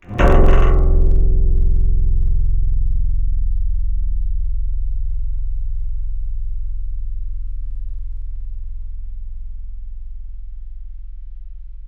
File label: Acoustic Low; Synthetic's Serum Collection; Surge Sounds - ASTRO - Serum Wavetables